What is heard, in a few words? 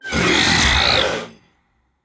raptor scream; soundscrate